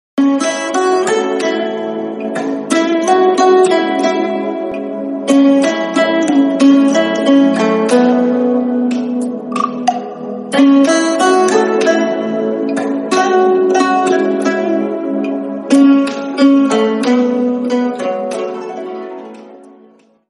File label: chinese bgm ringtone